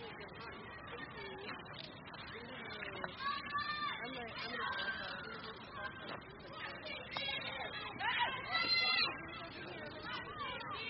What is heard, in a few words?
Park Playground